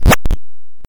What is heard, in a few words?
arrow drop; ludumdare; ld31